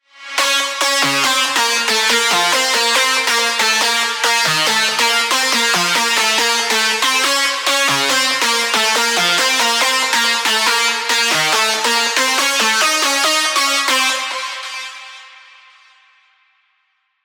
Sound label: leads pack